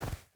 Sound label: footstep rubber boots snow run; Rust